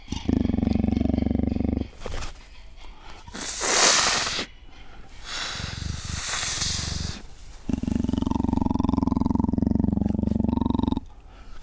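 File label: Panthera pardus juvenile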